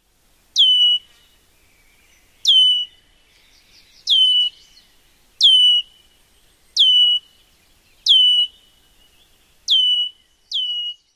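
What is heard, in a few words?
male alarm whistles, Red-winged Blackbird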